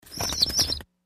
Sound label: Chick Peeps Flaps Win, chickens